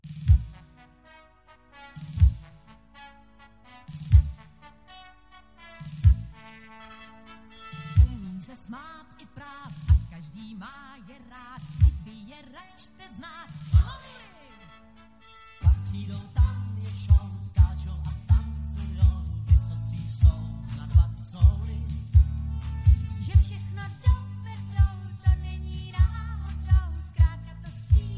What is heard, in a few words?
Karaoke